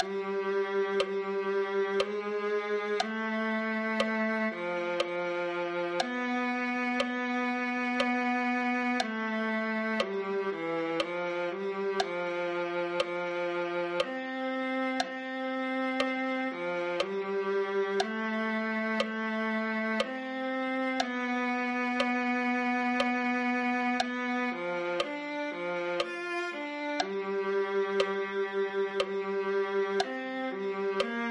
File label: viola